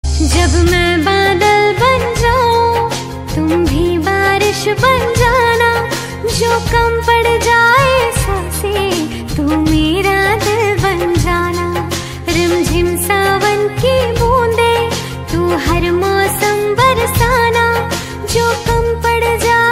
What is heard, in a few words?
Female Ringtone